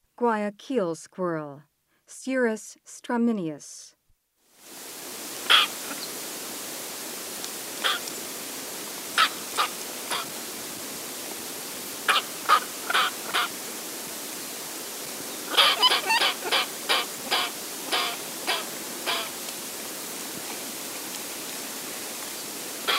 Guayaquil Squirrel, Mammals of the Rainforest; S. America

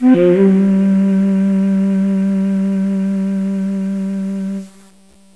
SHAKUHA G; INSTRMNT

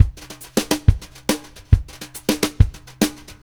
Drums, Jazz

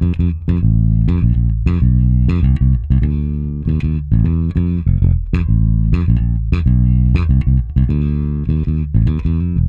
Bass F Funk

